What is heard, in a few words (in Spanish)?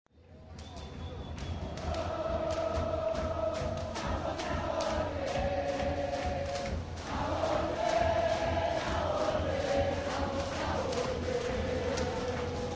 manifestaciones hinchas; sede rc mitre 800; Rosario